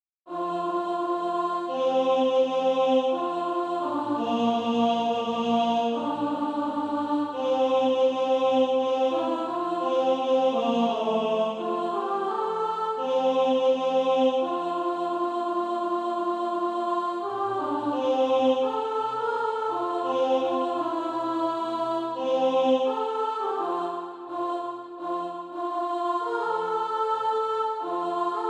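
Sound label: alto, Practise Music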